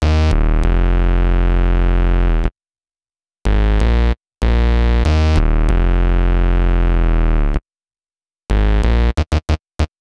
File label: Bass, Hip-Hop